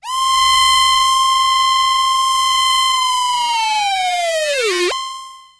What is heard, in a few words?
Roland D C6; Roland S100; musicradar-synth-samples